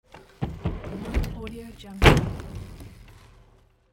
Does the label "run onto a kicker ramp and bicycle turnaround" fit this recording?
Yes